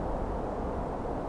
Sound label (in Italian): Vento, natura